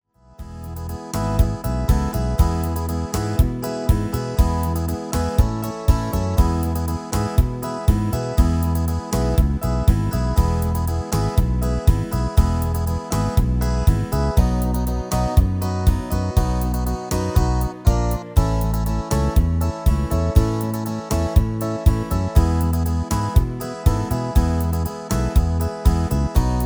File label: MIDI